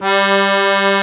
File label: AKK VIOLIN G, AKKORDEON; Best Service; Alpine Volksmusik